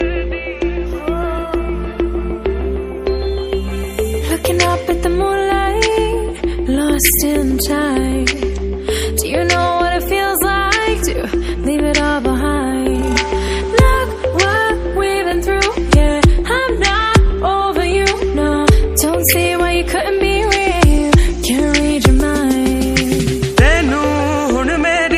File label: new korean mix hindi song ringtone, bollywood ringtones